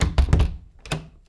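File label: wood lock; Mafie 1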